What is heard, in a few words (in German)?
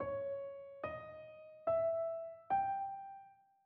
Tetrachord Span2 des auf; Tetrachorde